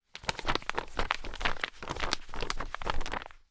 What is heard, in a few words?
Paper Fan